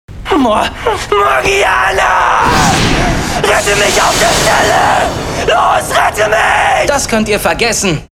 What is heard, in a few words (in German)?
synchro angstschrei